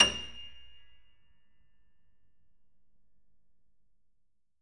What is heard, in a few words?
Grand Piano F6 ff